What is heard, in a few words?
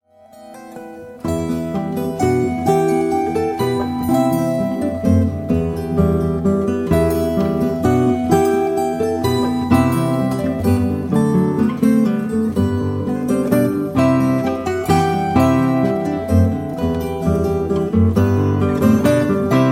Harp Guitars